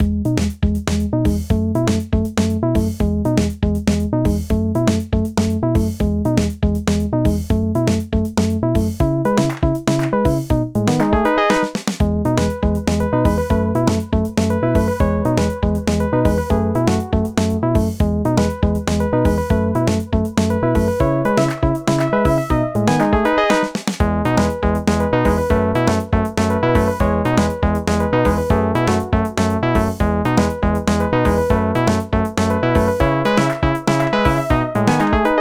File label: piano bounce